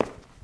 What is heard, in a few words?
foot tile; Zomboid 41.78.16; Project Zomboid